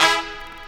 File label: HORNHIT, HORN STAB